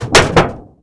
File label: Metal plane hit; AssaultCube; Alien Stars; American Muscle Series